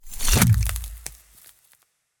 impacts and hits sub zero; fvtt sonniss gdc audio

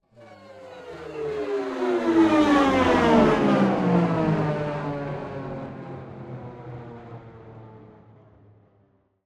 abstract spaceship flyby descending; sonniss gdc

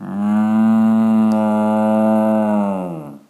cow moo; Creatures; Animals

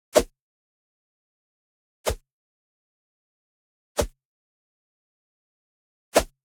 woodstick swish

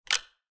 revolver prepare; ranged weapons guns; psfx

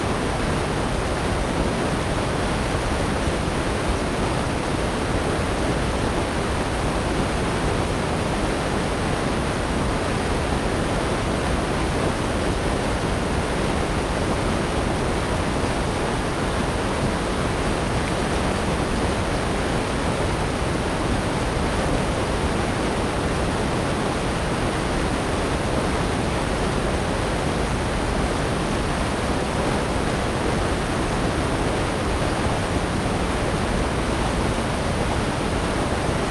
waterfall near field loop; sonniss gdc

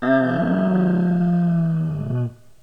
moan, Monsters; Creatures

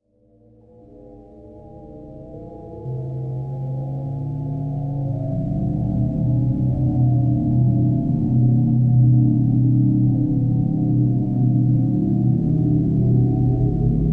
drone rising bells; sonniss gdc

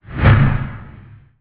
woosh; sonniss gdc

